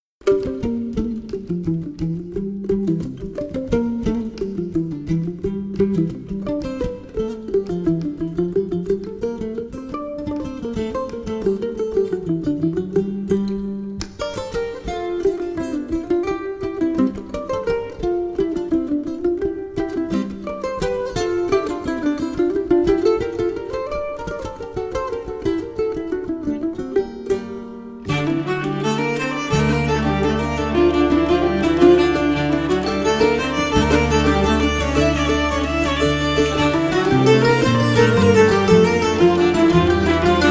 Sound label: Jig